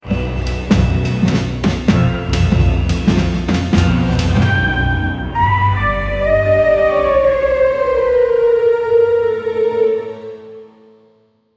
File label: halloween theme for spook